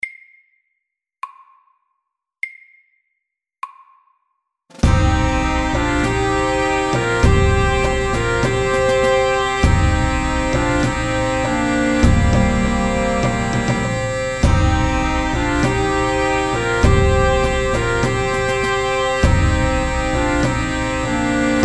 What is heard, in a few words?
Pipes and drums SLOW 100